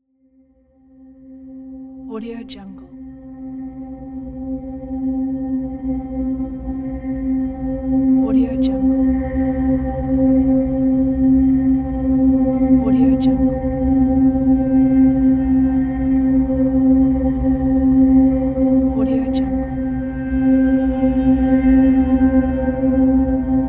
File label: musical drone pad